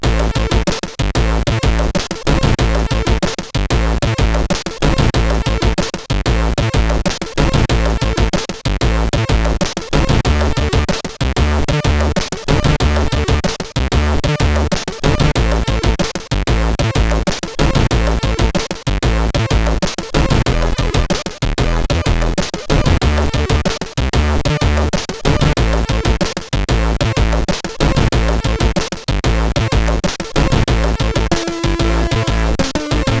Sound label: sid, CSG8580R5